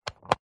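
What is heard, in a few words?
leisure remote control car remote switch click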